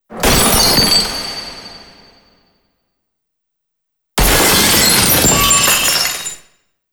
Broken Glass X 2; Empire Binge

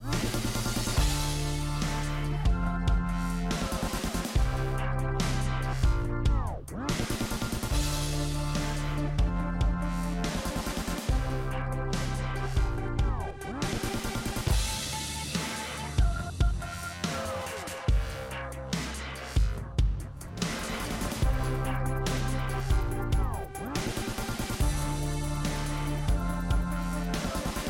Music; Unsung Hollywood S2; Launch; Cable

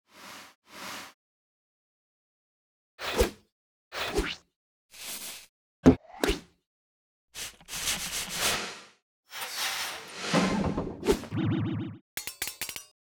SFX; The Rickey Smiley Show; Launch

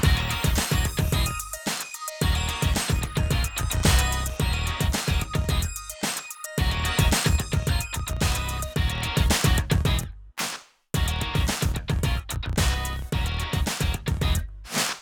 Music; Black Music Month

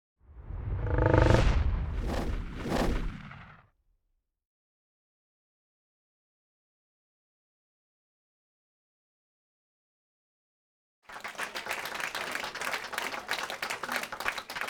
SFX; Hollywood Divas

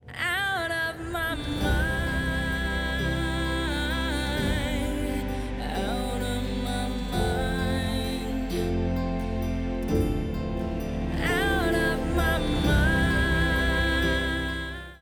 Music; Bad Dad Rehab; Bad Dad Rehab trailer

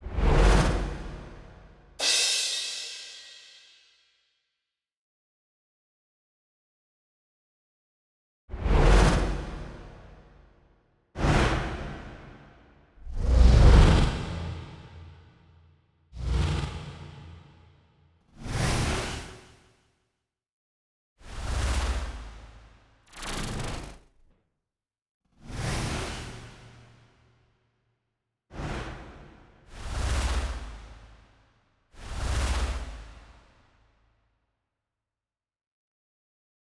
SFX; NewsOneNow Sizzle

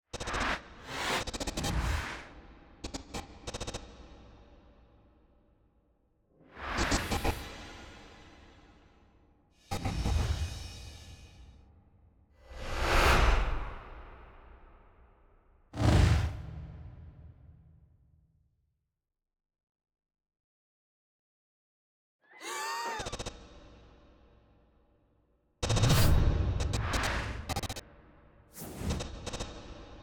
SFX; Next 15 season 1; Next 15 clip spot; Launch

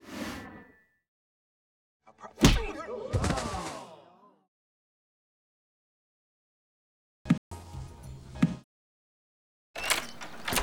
SFX; Star Studded Saturdays; Deliver Us From Eva

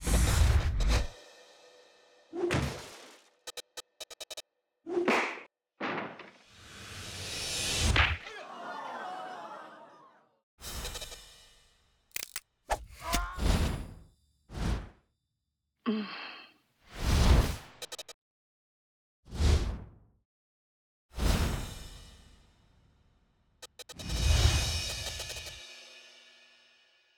SFX

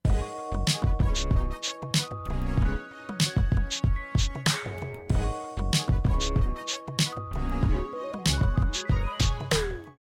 Music; TV1

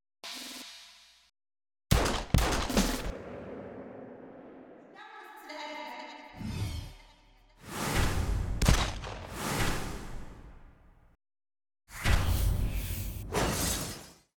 FX Stereo; Clean Cuts

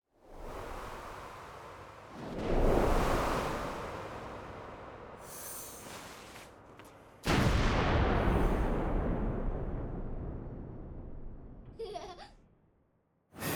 SFX; A Christmas Blessing; 2013 Fall Scatter Reel

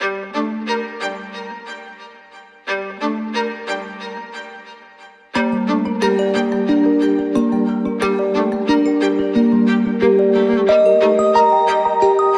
Dramatic Classical Hip Hop; UNSUNG S8 2015

